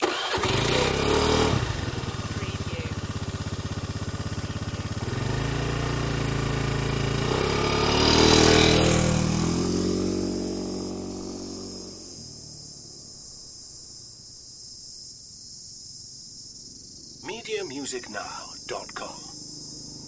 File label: desert motorbiking loop